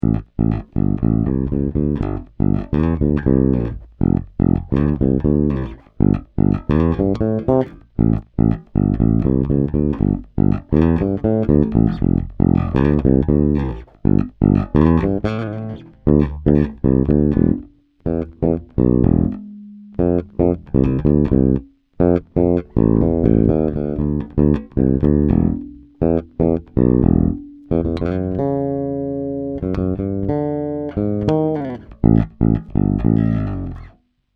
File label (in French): Passif C, basses